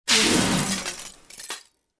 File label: big metal collapse crash